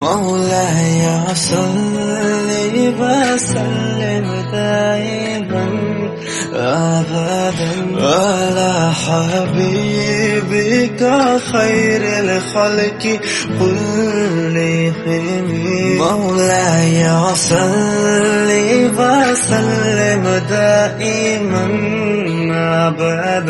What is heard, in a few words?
islamic